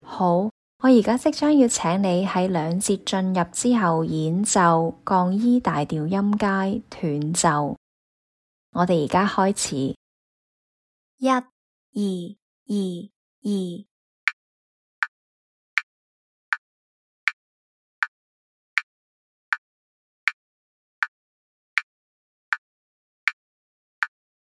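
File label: E flat major scale staccato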